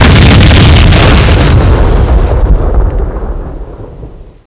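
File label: mega explosion; svencoop; hunger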